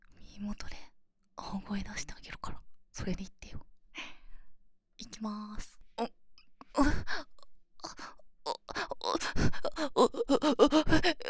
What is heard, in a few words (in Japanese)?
オホ声